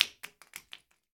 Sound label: Drop Mobile Phone